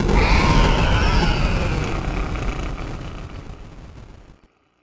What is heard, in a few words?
Pig death Scream